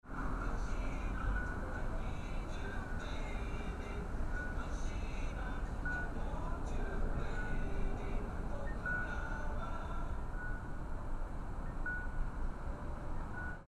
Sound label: large monitor; ekimae